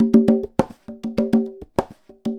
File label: Conga Loop (100BPM); musicradar